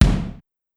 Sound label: Kick, Pitched Up; Clavia DDrum-AT